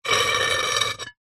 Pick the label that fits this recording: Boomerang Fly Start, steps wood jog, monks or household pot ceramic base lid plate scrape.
household pot ceramic base lid plate scrape